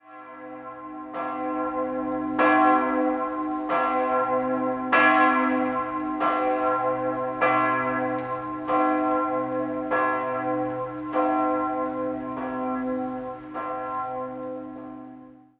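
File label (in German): glocke mit zu grosem klöppel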